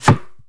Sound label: Bullet Impact Wood Light; jasem just another shooter with electronic music windows 2017